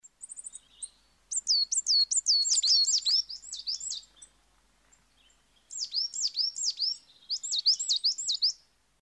sikora modra; karty obrazkowe; przygotowania do zimy

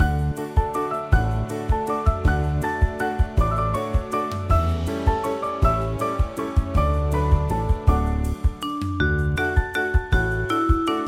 plas bez wokalu

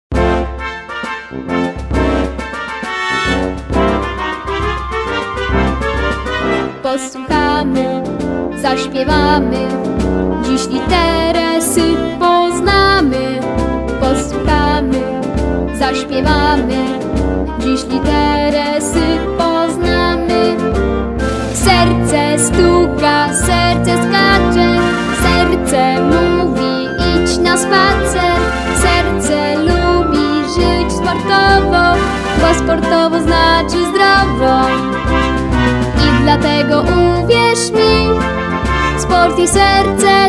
piosenka litera s; szkola marzen na tak; klasa 1

